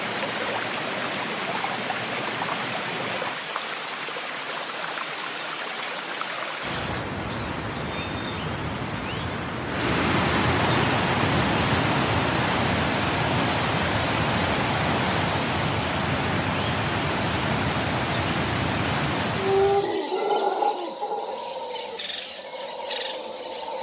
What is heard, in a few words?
Running Water sounds